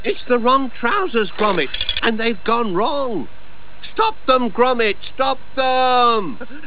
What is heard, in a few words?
trousers